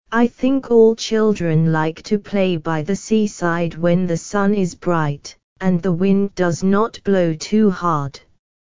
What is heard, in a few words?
children